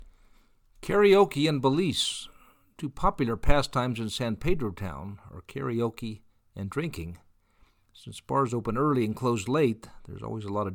karaoke in belize

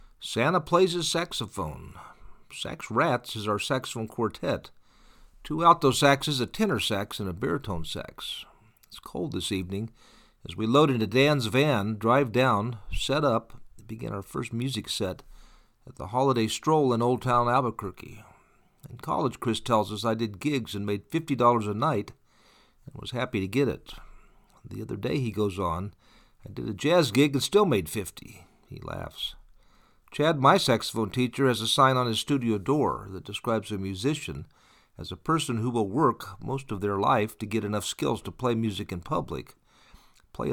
saxophone; santa

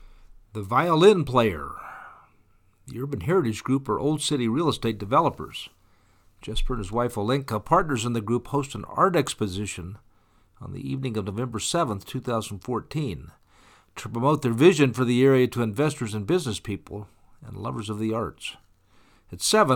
the violin player

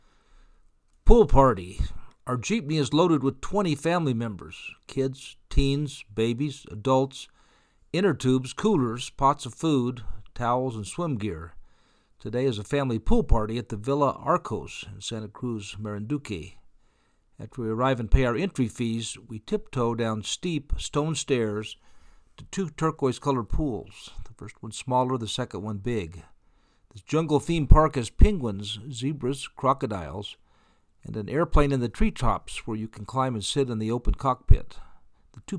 pool party

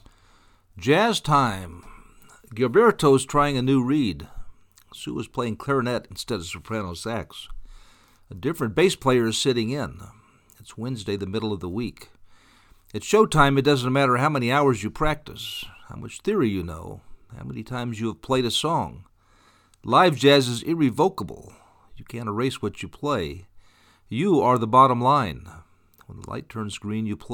jazz time

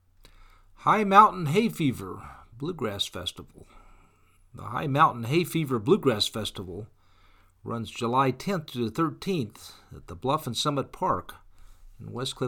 high mountain hay fever bluegrass festival

